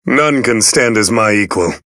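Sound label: level up physical; Fire Emblem Echoes SoV; Berkut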